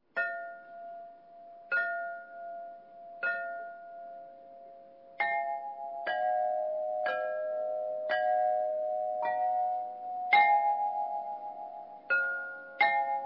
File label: clock; orologi